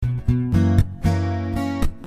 Punchy B,Gb 118

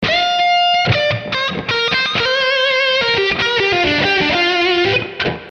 G29 98 blues lead

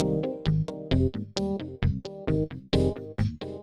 Funky 125-132 Fm7 9; Loops

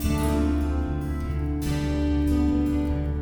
Picking G

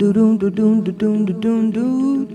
Vocal Echoes

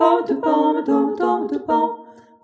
Jazzy Harmony

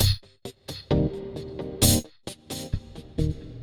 Funky 125-132 Cm7; Loops

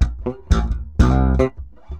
C 120 Steppin Funky

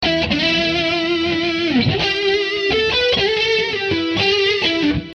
E5 134 rhythm

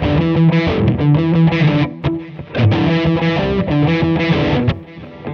90 Verse Rock E; Loops

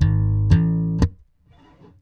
Bb 120 Funk Rock

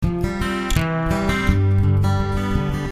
Picking F,C,G 85

